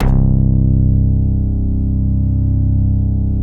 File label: SMACK; Masterbits - Tekkno Therapy